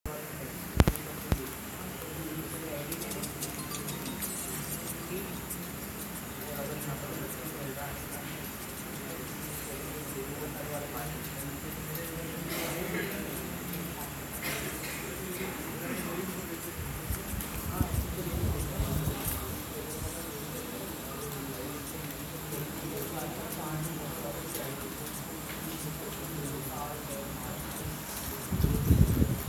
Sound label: karaoke recording